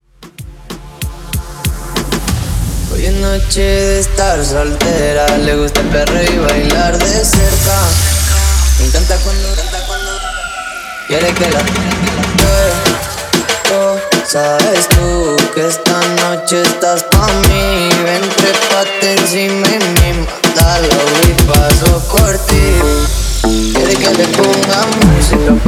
Acapalla Break Out Intro 95 BPM

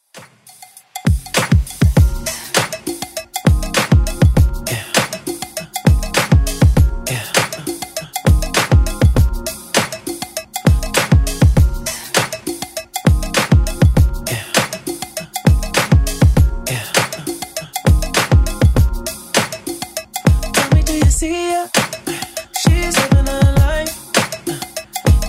Top40 Ext Redrum 100bpm; remixplanet

